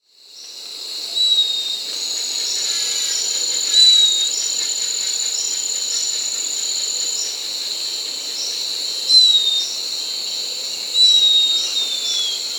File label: Semicollared Puffbird (Malacoptila semicincta), Peru; S. America